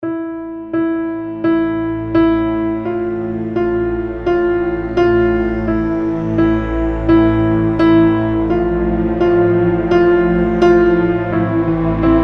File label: JINGLE; HRSDC